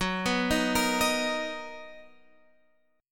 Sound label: B Major 7th F sharp, chord